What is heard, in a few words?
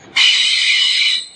subway sound; Subway Sound Construction Kit